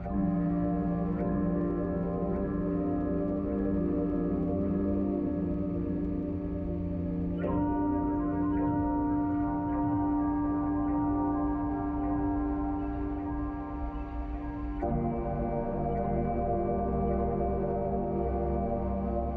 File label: music light, soundtrack; 2kki